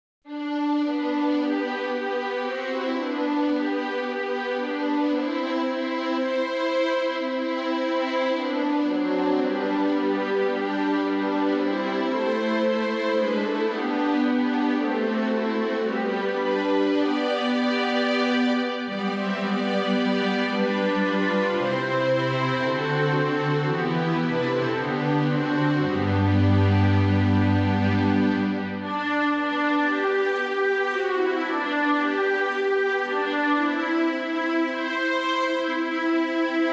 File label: With Wondering Awe INSTRUMENTAL